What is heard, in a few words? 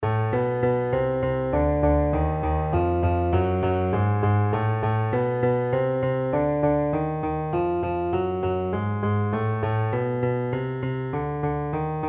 Shepard